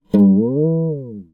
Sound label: cartoon twang bounce boing spring